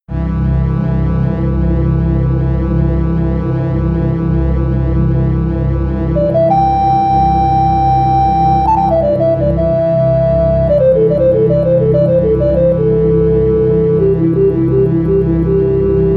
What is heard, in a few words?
Ambient 50 bpm